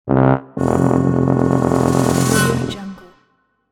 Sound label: cartoon comical tuba mistake; applehillstudios